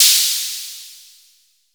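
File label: SWOOSH DEEP; Crypton - DrumBase5000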